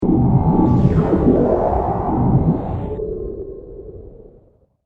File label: Future Impact And Atmosphere; science fiction sound effect; Andrew Fleming